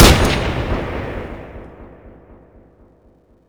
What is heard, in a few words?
50cal 1shot